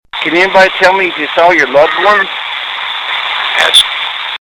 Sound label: ghost box